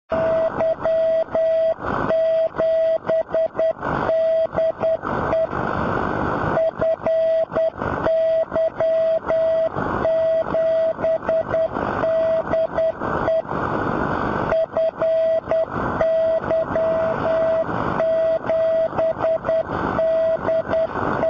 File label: 6m beacon